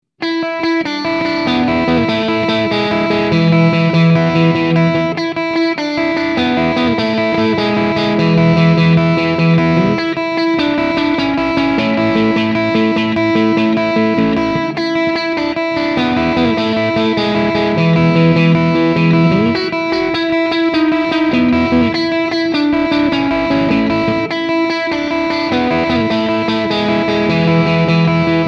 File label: blues rock